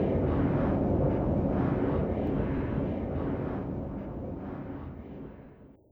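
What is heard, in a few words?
Booster Interior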